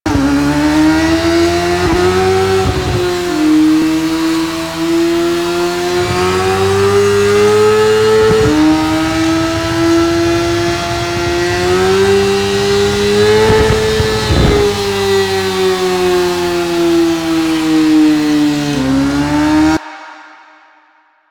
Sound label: Motorcycle Loading Tunnel Loop; Warframe; Nineteen Ninety Nine; Quests; Mall Intro